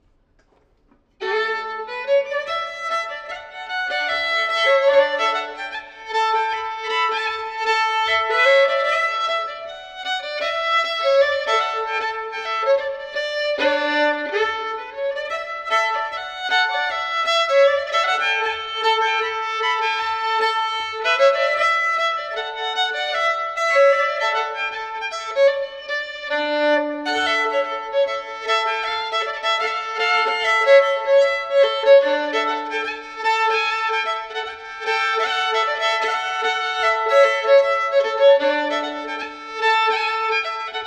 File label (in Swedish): Reinlender